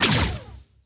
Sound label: rifle fire; weapons